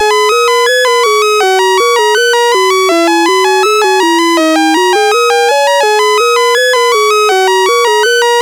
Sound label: Shuffle BASIC sid CSG8580R5